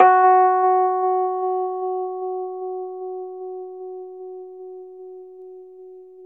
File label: Rhodes 73 F#; E-MU Producer Series Vol. 5 – 3-D Audio Collection; 3DRhodes